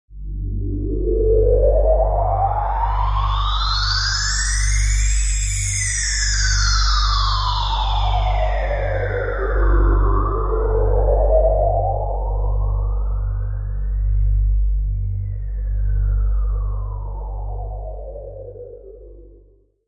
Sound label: Forma Sweep Ambient, thriller-horror ambience sound; High quality thriller-horror ambience sound perfect for building tension and drama in film or game scene.; Scary Soundscape